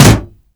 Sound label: wood impact, Bullet Impact; Dystopia; physics